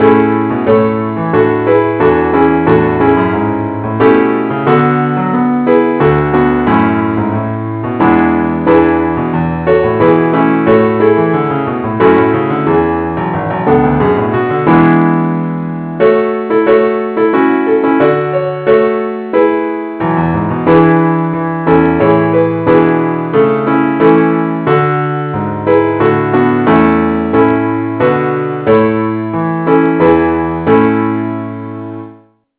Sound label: keyboard only key a; i need thee